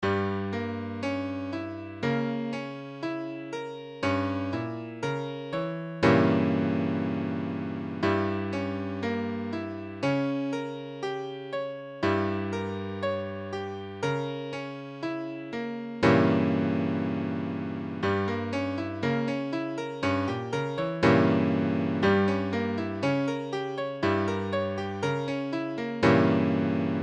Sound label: Gdim7, piano